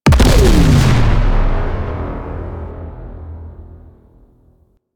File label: Obelisk Blast Blue; Warframe; Infested Eris